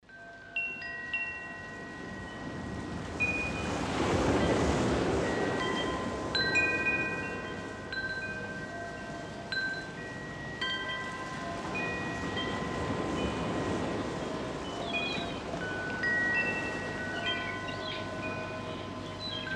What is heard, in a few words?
Chimes; Coorabell